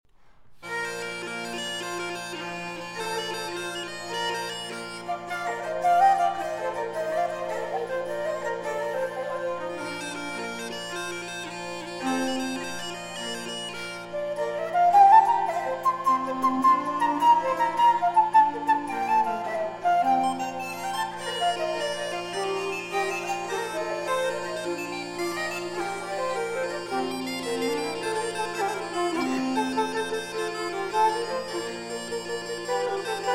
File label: Divertissement Champêtre in Trio For Musette, Flute and Violin II. Gaiement